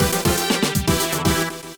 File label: Jingle, Virus Sweep; bomberman 2; nintendo ds